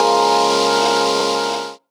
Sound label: PAD SHUSH; Rawcutz - Nu Forms; Gbm